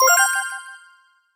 Collect Coin Appear

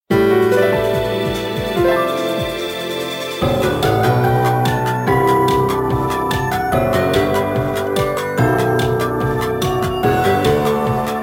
Background music; Rosalina's Ice World

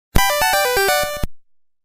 Pause jingle; G&WG3

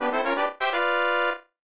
Power Up Fanfare; SMAS; SMB2

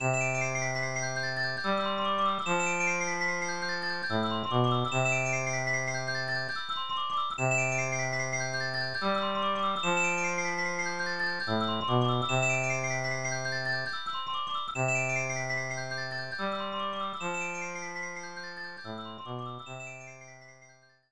King's Chamber; SMAS; SMB3

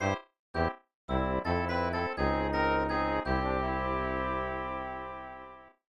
Game Over; Super Mario All-Stars; SMB

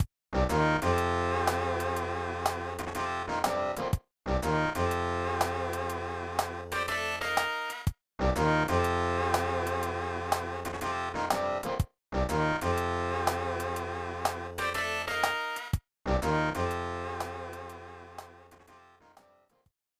World 7 Map; SMAS; SMB3; Super Mario All-Stars

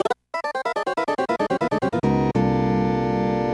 Yoshi death music; SMM

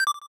Cancel; SM3DL

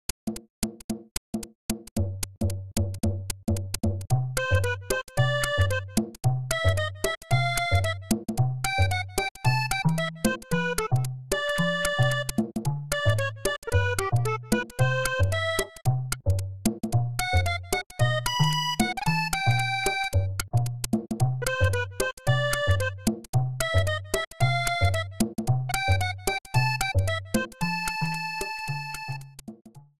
Opening Credits; Mario Paint

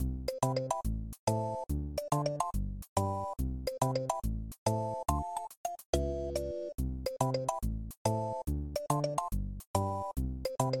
Donut Plains Map Theme

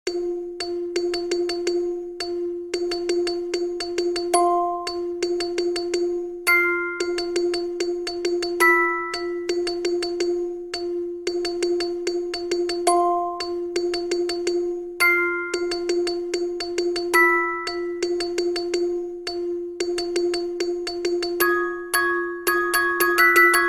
Airship Night; SMM2; SMB3

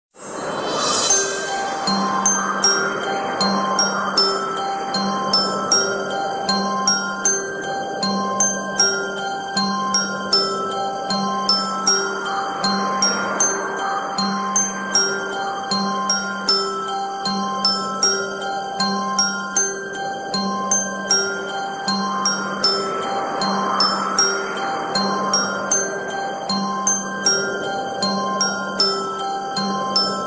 Defeat Theme; Bowser

